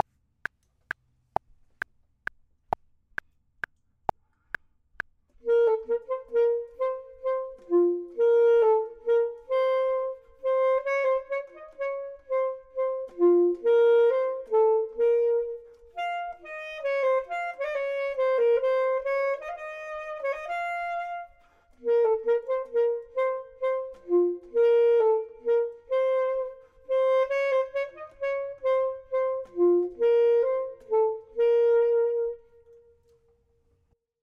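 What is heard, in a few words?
top, Saxophone and Clarinet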